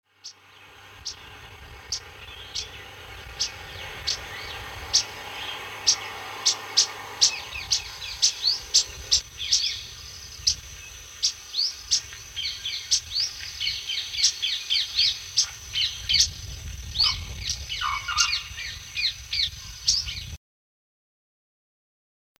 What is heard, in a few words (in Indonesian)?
suara burung cabai gesit